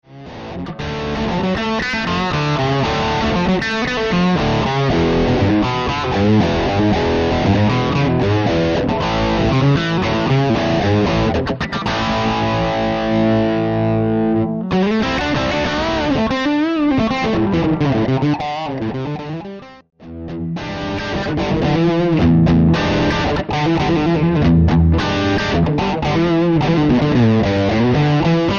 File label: crunch